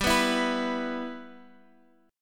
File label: G 6th; G6 strummed; x,x,5,4,5,7